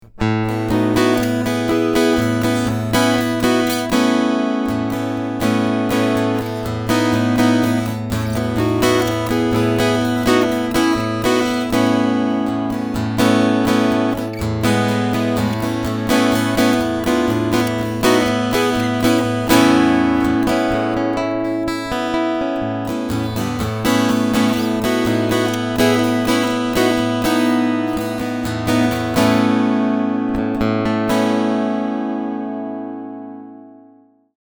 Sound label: Strumming EQ 50